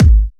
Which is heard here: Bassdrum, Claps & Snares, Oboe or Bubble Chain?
Bassdrum